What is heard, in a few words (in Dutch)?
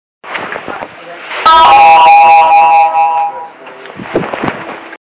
extreem welkomst geluid